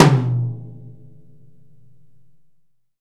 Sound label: Tom, EMU PX-7 Drum Machines